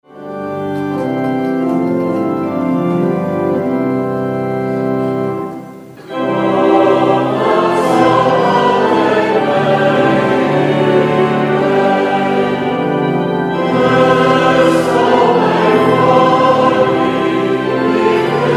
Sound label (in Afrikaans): Gemeentesang